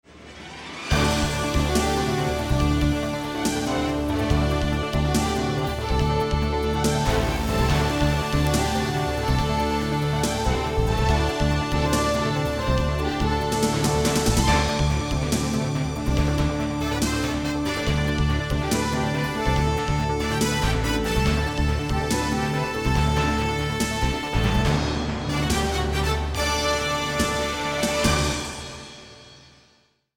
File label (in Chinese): 片头音乐